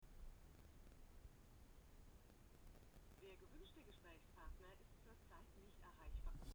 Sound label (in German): Schoeps CMC6 Einstreuung Mobilfunk 5cm